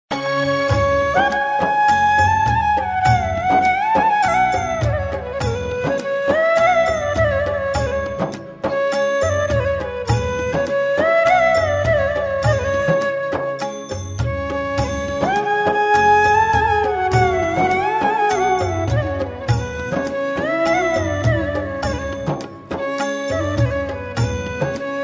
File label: Instrumental